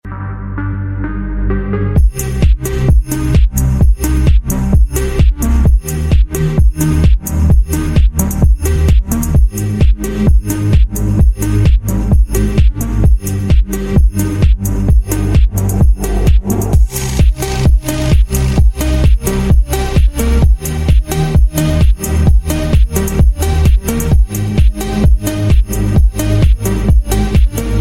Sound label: Phonk; ringtone funk; آهنگ زنگ فانک